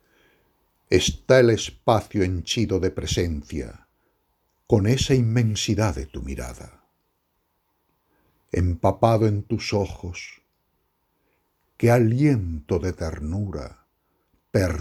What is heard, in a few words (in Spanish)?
Latidos